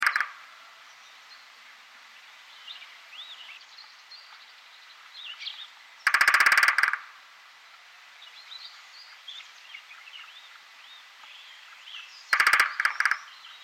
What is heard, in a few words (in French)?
pic epeiche dendrocopos major; faune et flore; vertebres